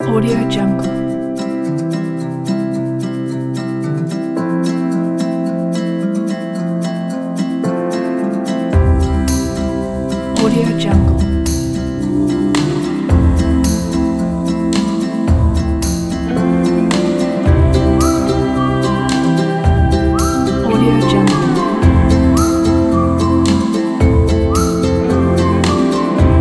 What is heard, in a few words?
folk